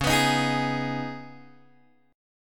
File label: C sharp 7th Suspended 2nd, Guitar, Open G; down; chord shape